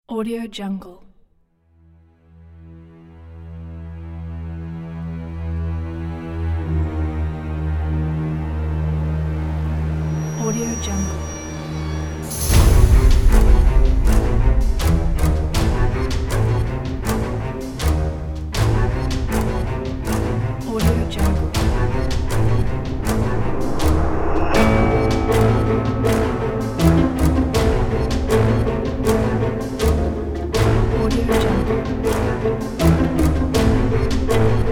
psycho suspense

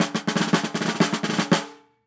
drum snare roll

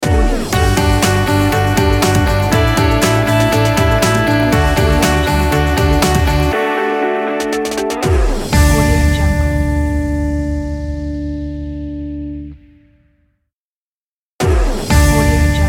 heartwarming theme logo

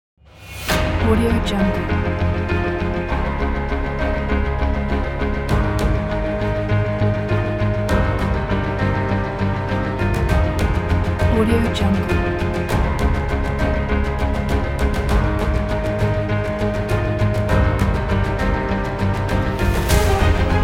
inspirational trailer

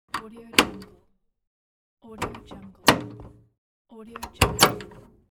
door lock

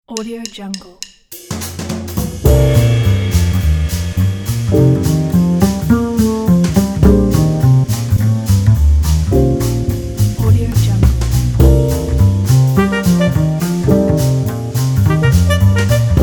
jazz swing

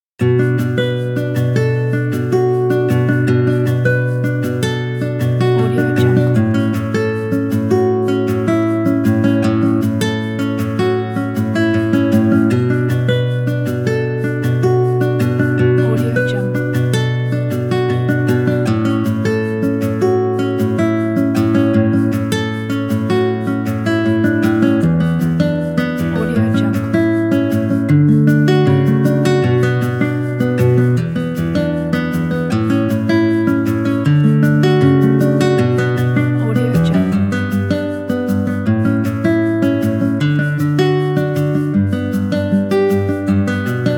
sad guitar

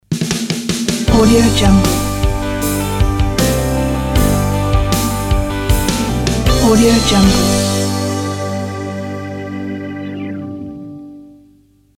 pop rock logo; swedenstockmusic

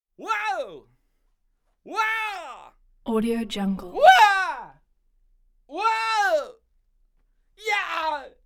scream pass by yells silly happy some gurley

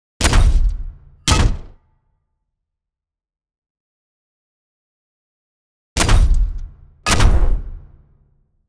brutal gunshot

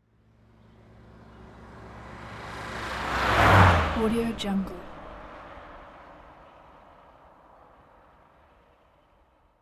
car pass by village road; moonaudioproduction

